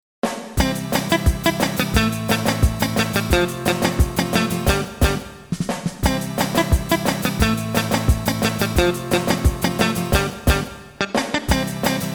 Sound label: no Bass